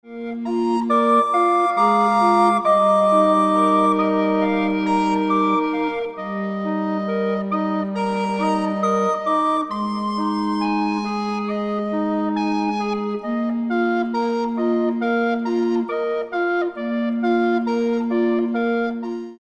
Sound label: Recorder Ensemble